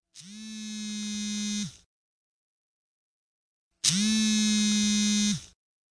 cellphone vibrate

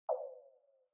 Scanner Notification

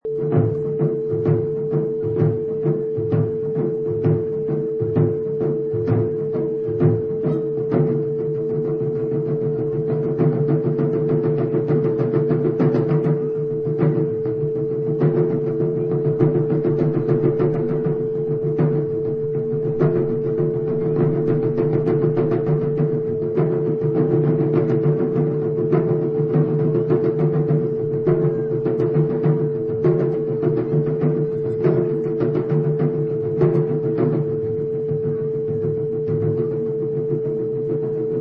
Taiko Drums

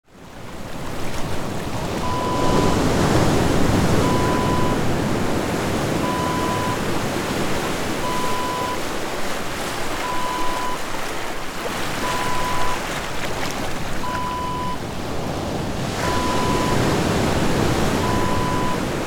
ocean waves

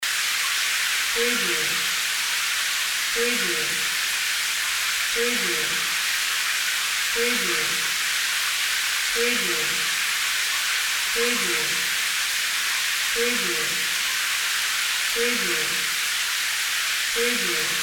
heavy rain

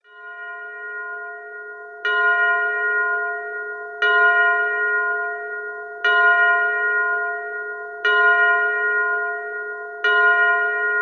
Big Ben

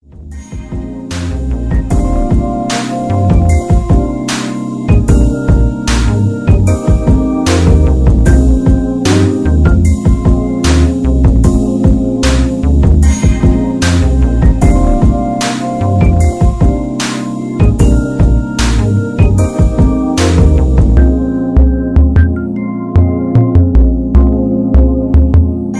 jazz

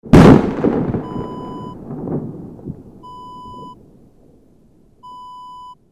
explosion

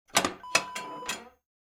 wood oven door open

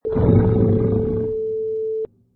Lion Growl

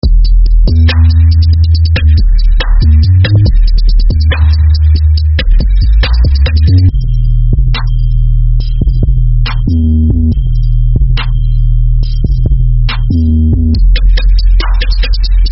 808 bass

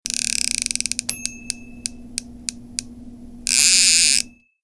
Fishing Reel Drag

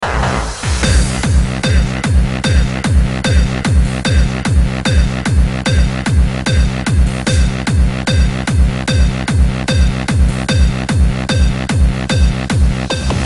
jumpstyle; madness

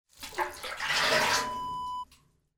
water scoop

